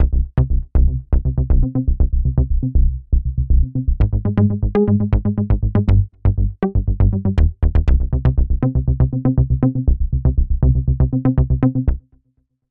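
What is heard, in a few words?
Solid Sequencer Bass, synth; KORG OASYS; oasys assault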